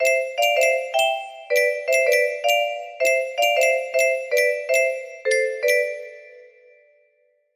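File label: lullaby, music box melody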